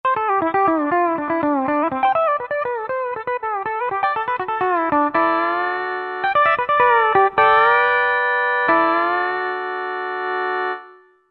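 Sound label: Pedal Steel Phrase; Country; Roland SR-JV80-17 Country Collection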